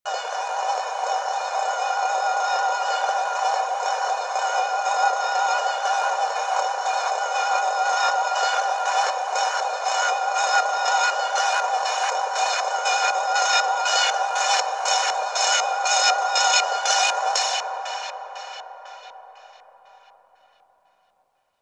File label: bowl